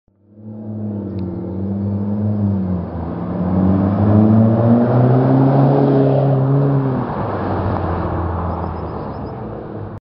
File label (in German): 4 Zyl Vorbeifahrt